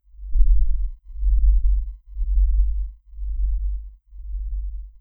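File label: tranq wobble right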